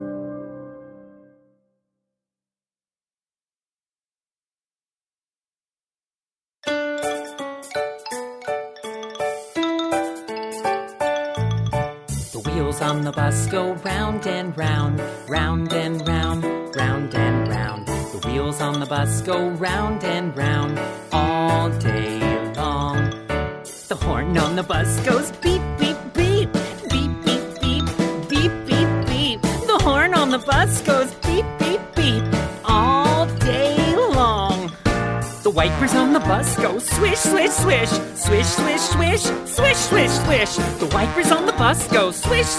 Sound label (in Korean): 영어남 동요 남아목소리; 남자; TheWheelsOnTheBus